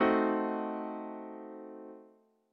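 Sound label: A diminished chord; ackordtabell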